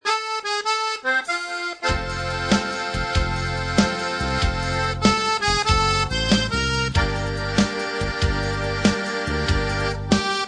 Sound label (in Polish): srebrne saksofony